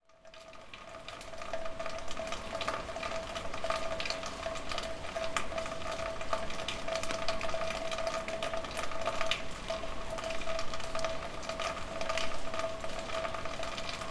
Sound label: Water, City, Rain, Roof; West Wolf